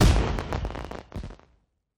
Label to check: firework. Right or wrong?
Right